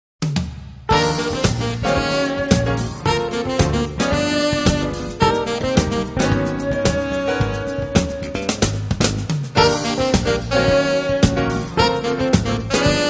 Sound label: sounds of watercolors